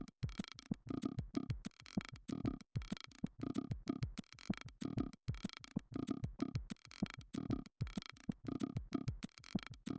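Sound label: with drums mix; SiSEC10; underdetermined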